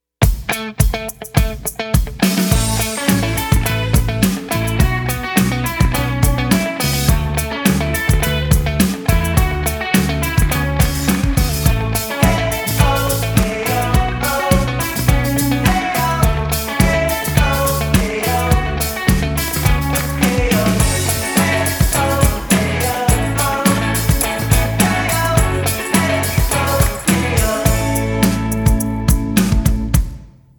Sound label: Going Places with Vocals; Gratitude Sound